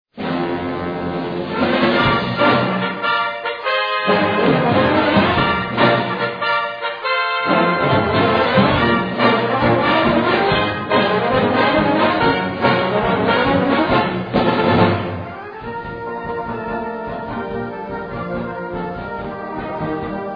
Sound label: Sounds Of Brass